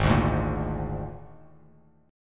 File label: Ab Suspended 2nd Flat 5th chord; 4,5,6,3,x,x,x,x; strummed; JGuitar